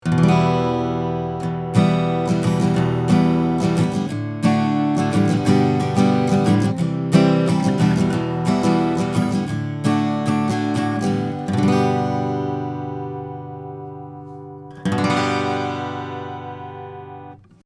Austrian Audio OC 16 Acoustic Git 40cm 15thFret

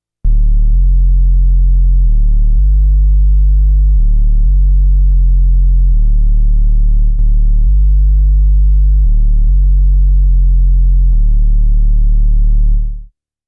MS-20 Almost Sine Bass

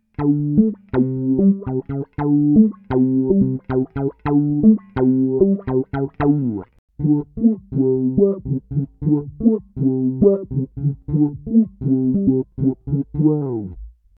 Disco Talkbox

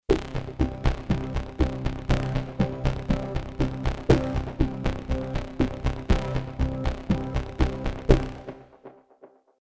Pulses 8ths; Elysion 2; Terra

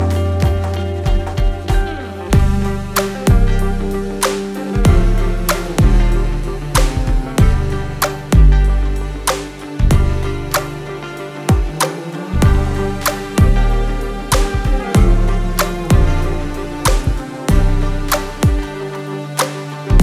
drums taming IN Oeksound Soothe2; KOMMERZ

